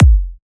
Kick, F; House; Drum One Shots; Howl Kick

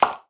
Percussion; Cymatics; Future Bass Starter Pack; Drums - One Shots; Heater

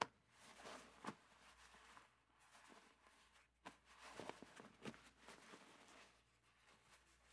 Cloth Movement; Fairlight Sound Lib

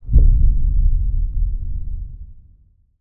Explosion Deep

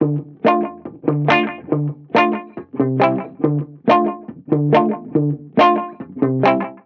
Reggae Guitar Loop 140 BPM D Maj; Vibrations; Cymatics; Vibrations Guitar Sample Pack

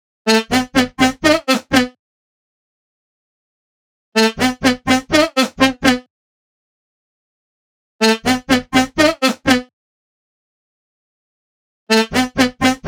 124 BPM E Min Lead, Melody Loops; Loop Stems; Cymatics; Anubis

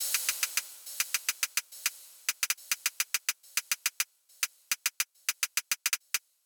Riddim Drum Loop 140 Top; Ghosthack; Riddim Essentials Volume 2; Full Drum Loops

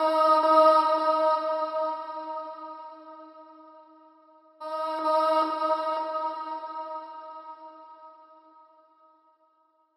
110bpm E, Vocalpack

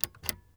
Gear Foley Reel to Reel Button; FX; Cymatics; Eternity Sample Pack

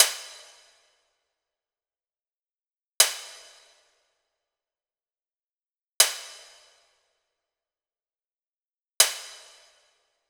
Hip Hop, 80, Perc; Drums; Drum Construction Kits; Loops; Ghosthack; Drum Mega Bundle; Drum Hero 5; Kit Hip Hop 1

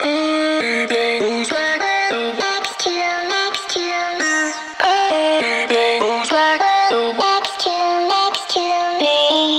Vocal Chop 100 BPM C Min; Cymatics; Euphoria Vocal Sample Pack

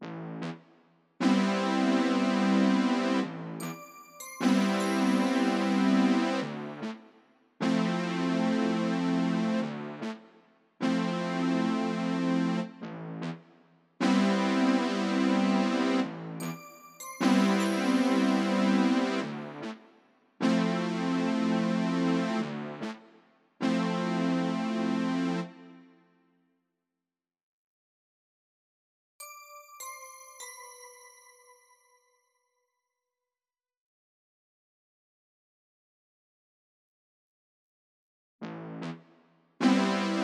75 BPM A Min Synth; Nationals; Retro Collection

